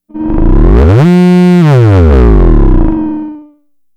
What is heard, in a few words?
FX, Drum Machines; Fricke Schlagzwerg